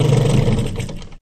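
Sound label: 9.9 Evinrude Shut Off, boat; 9.9 hp Evinrude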